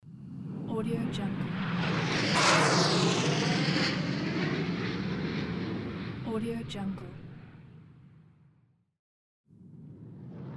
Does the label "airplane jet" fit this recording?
Yes